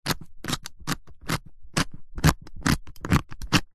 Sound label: foley luggage briefcase combination lock dials turn; zapsplat